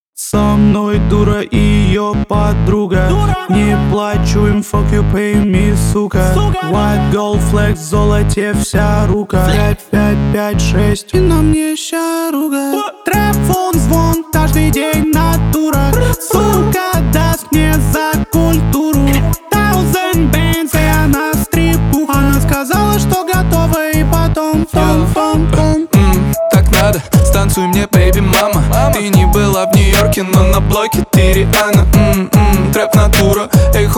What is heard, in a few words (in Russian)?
Trap phone звон